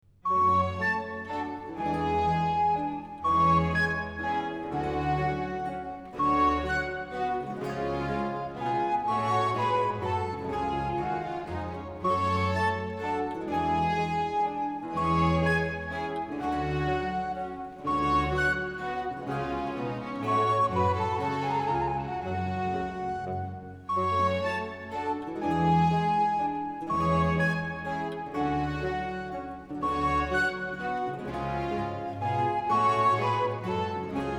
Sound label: Water Music Suite 03 in G (HWV350) - 04 Andante; HANDEL; Pinnock